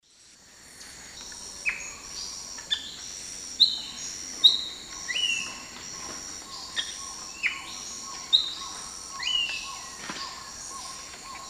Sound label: Icterus chrysocephalus, calls or song; S. America; Eastern Lowlands